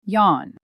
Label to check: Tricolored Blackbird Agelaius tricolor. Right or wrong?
Wrong